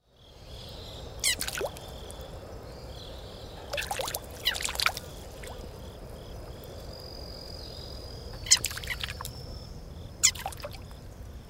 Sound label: ushi babies